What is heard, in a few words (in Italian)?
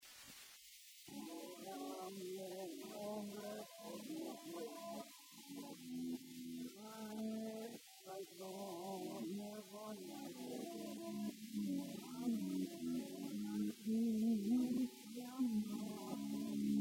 canto intero; sant'erasmo